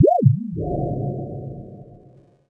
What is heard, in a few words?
relay signal